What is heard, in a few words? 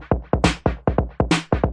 138 bpm echo middle hit, drum loops and breaks